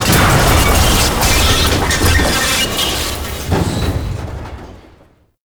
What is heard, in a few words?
Academy Door Destroy; Tauron; Warframe; Betrayer